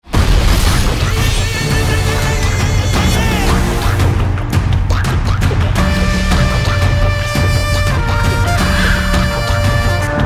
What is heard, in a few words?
Music BGM Ringtone; Bimbisara